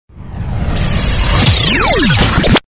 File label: Galery Noise; Sound Ideas - Galery Noise 002